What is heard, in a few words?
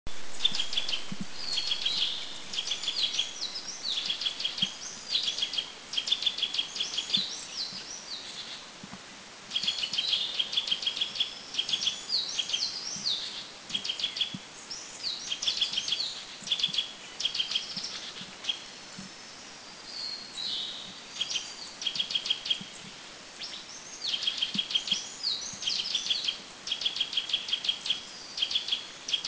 Eurasian Nuthatch Bedongyueshan; Sitta europaea; Taiwan